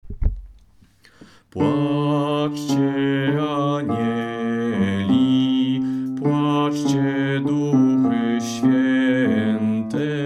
Placzcie anieli bas; pasyjne

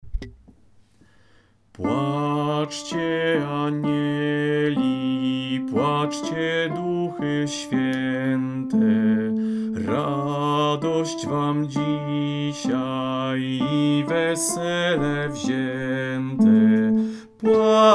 Placzcie anieli alt; pasyjne

